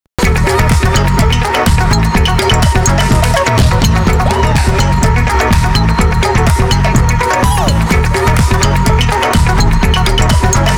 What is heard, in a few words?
loop yeah